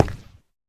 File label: activate, shelf